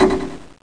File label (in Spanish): CLACK PORTA ASCENSOR